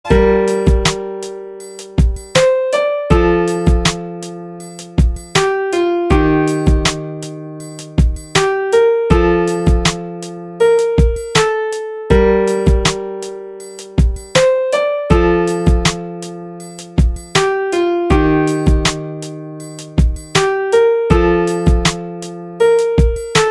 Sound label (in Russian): Инструменталка; Для Иры